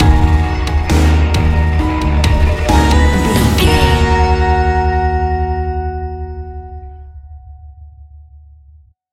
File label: nocturnal terror stinger